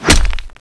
knife hit wall; cstrike; JailBreak; Balrog

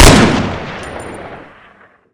svd; cstrike

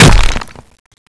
knife hit wall; cstrike; JailBreak; Janus

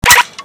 locked sound; cstrike; pubg

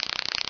taser hit; cstrike; jailbreak

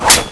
knife hit wall; cstrike; JailBreak; Rambo

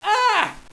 pain, hostage; cstrike

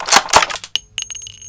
scout bolt back; weapons; cstrike

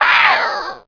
zombie death; cstrike; jailbreak

